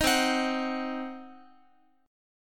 C Diminished C, Guitar 4A,4E,5A,5D,5G sharp,6C sharp; strummed; JGuitar